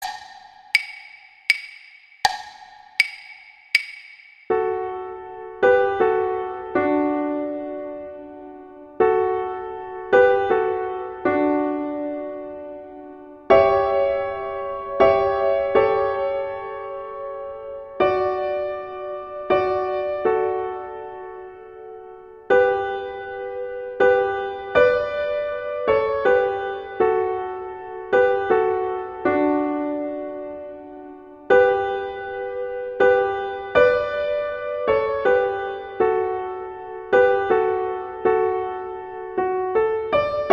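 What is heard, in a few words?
accompaniment; Silent Night; Gruber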